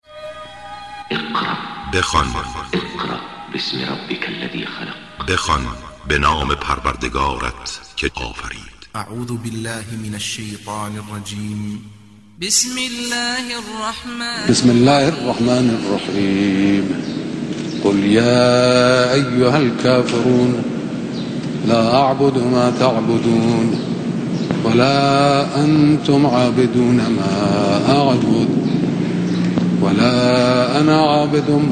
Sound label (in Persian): تلاوت سوره کافرون